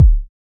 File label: Kick; Samplepack Produtique